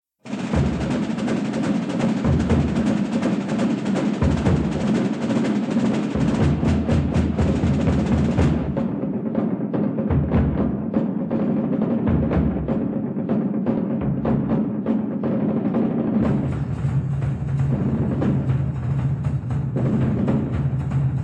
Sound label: drum break